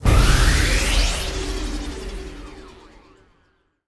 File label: enter Game; netent; starburst xxxtreme